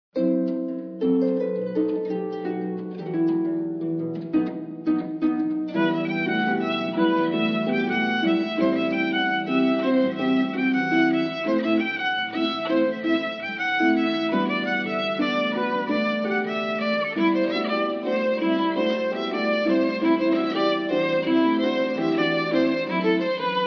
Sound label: Piano